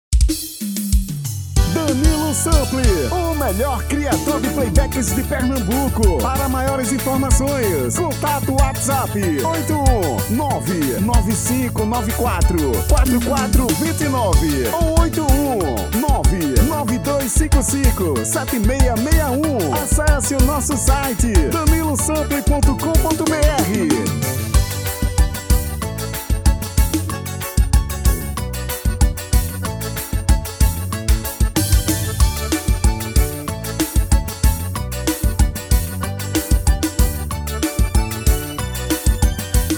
Xote

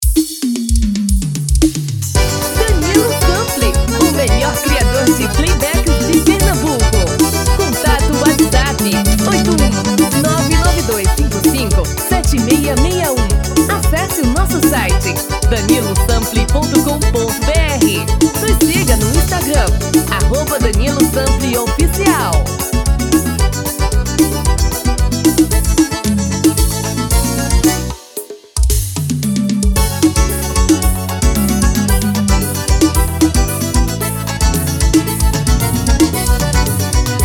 Forro das Antigas

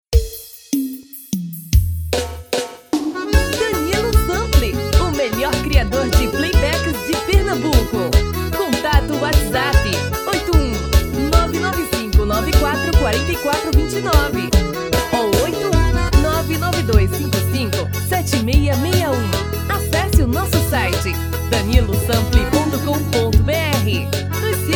tom masculino padrao

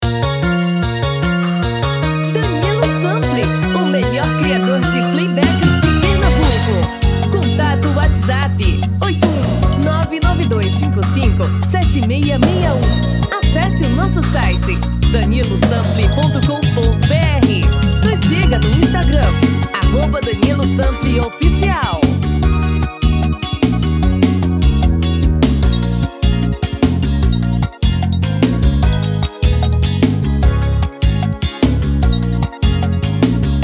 Brega

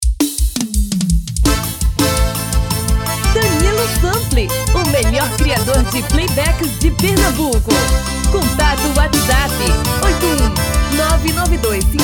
arrasta pe tom feminino

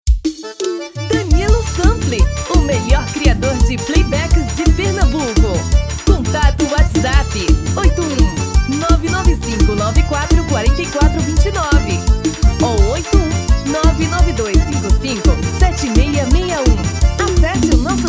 Arrasta pe

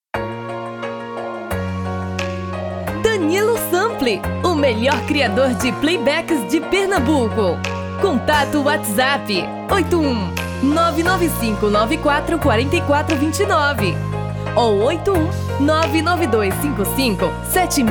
Forro das Antigas

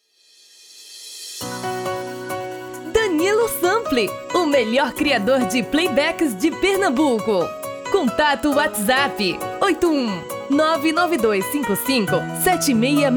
Brega

